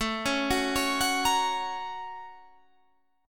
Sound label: F sharp Major A; arpeggiated; JGuitar